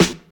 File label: 00s dark snare drum sound f key; snare drum samples